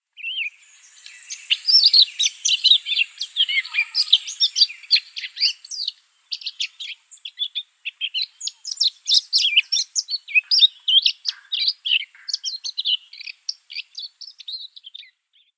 Blackcap subsong

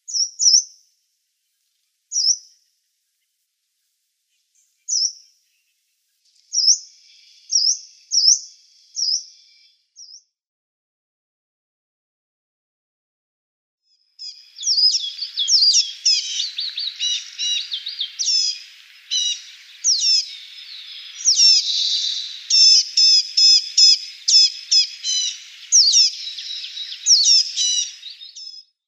Yellow-browed Warbler calls

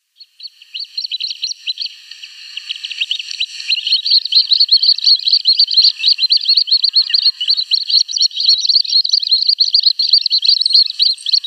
Little Grebe downy Young

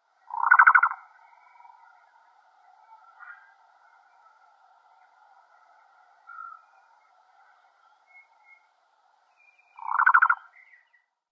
Black-billed Cuckoo call

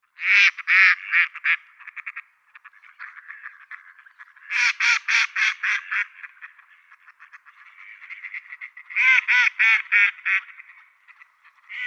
Mallard display

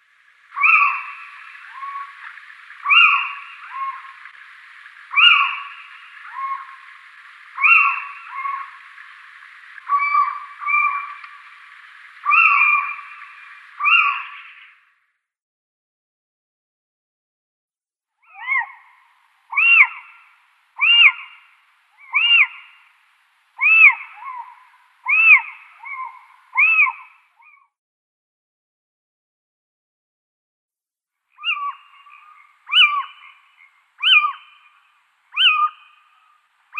Little Owl calls

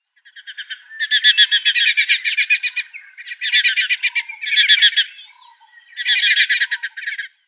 Banded Martin calls

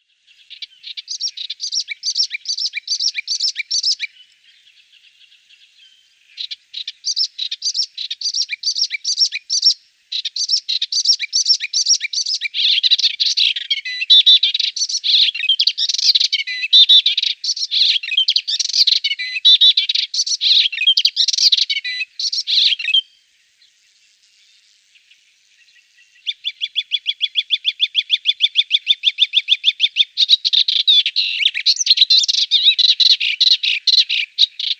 Melodious Warbler song

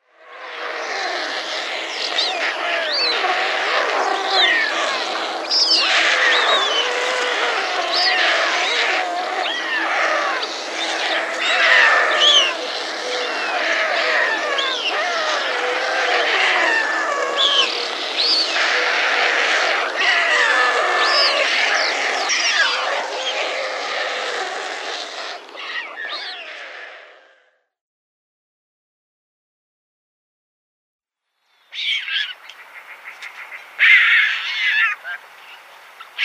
White Stork nestlings

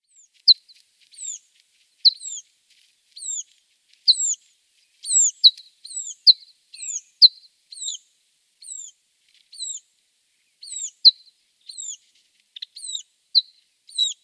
Red-throated Pipit calls

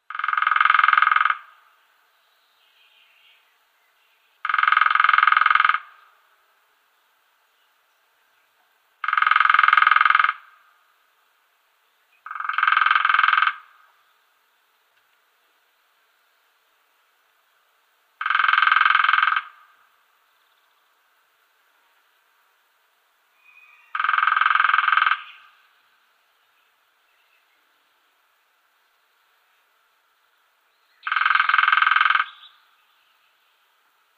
Lesser Spotted Woodpecker drumming

